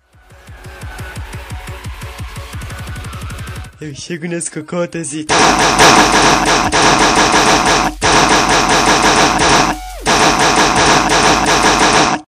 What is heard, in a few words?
ssssssssss